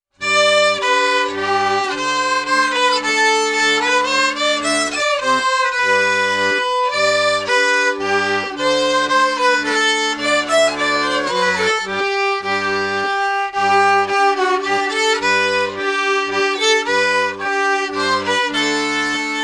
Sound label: mazurka